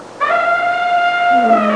Elephant loud horn

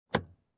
Opening glovebox

